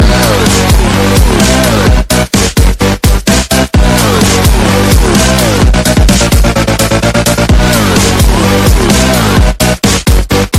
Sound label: ringtone